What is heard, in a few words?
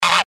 ugh